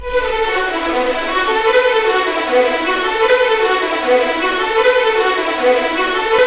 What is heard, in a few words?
RIVER; East West; Scoring Tools; ORC; River Flow